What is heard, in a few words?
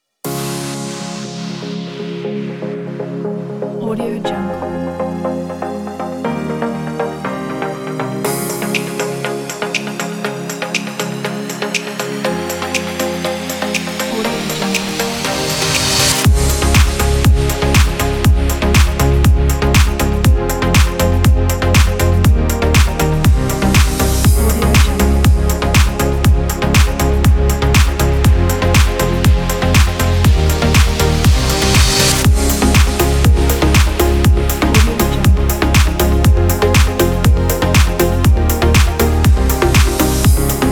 electronic